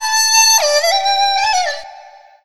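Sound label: Chinese FLUTE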